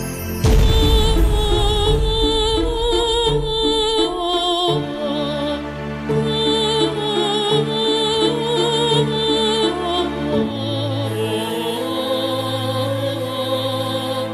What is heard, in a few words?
Vocal; Anamika Sonytv